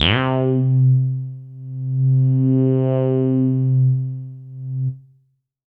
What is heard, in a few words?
C3, synth line; 90 s Sample CDs; NiceBeats; SynthLine VOL-3